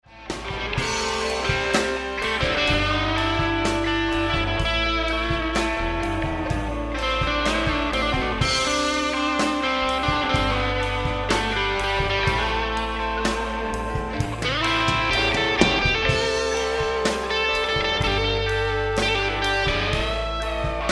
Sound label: Lead